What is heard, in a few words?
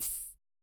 cymbal, Roland MC-909